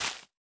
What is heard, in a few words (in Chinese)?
孢子花破坏声